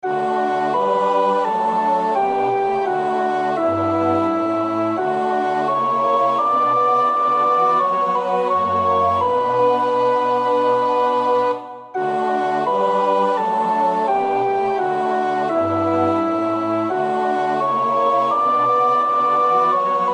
CHORALE sopraan; Bach (Johann Sebastiaan); BWV 244 Matthäus Passion; No 44. CHORALE- Befiehl du deine Wege (koor 1 en 2)

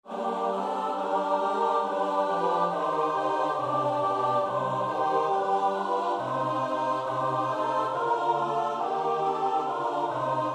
S-A-T-B; Bach (Johann Sebastiaan); BWV 014; 5 Gott Lob und Dank

